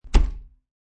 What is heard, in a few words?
Small Refrigerator Door Closes; Food Prep; Restaurants